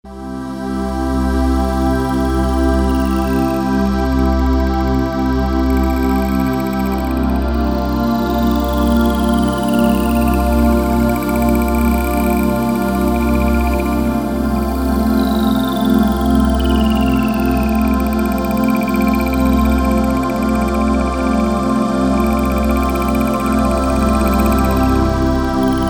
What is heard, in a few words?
Dolphin Pad